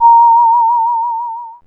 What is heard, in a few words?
Synclav FM, WIND, A#; Big Fish Audio